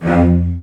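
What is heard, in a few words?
CELLOS G2, Strings; ILIO; Synclavier Strings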